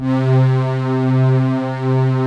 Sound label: ANALOG PAD; TR PADS; Pro-Rec - Synthsonic